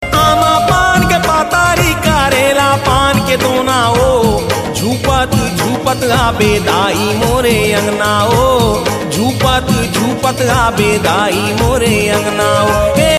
Chhattisgari Bhakti

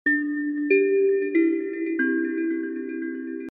Stream Bell

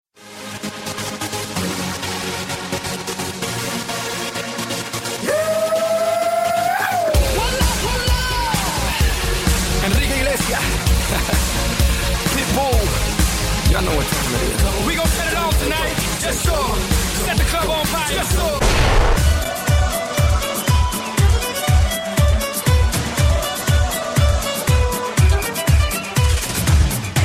Party Groove

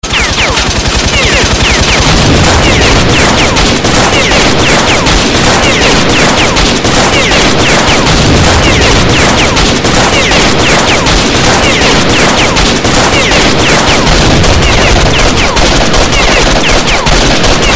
Gun Effect

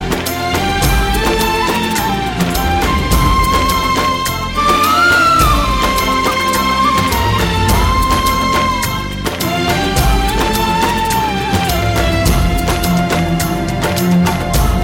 Heart Touching Music

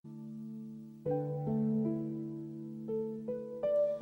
Message Tone

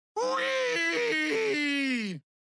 Woohoo; Grohk

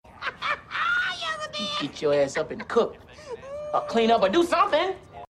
Mom Laughing

